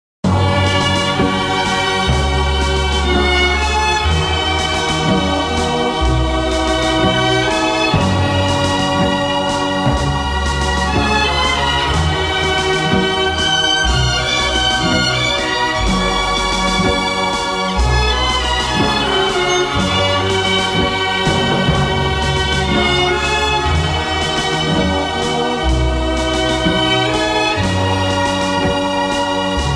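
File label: instrumental, symphony orchestra; DUBROVACKI SIMFONIJSKI ORKESTAR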